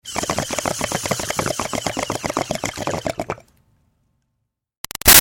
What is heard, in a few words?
Bong Water Pin In Inhale Bubbles Med; Nature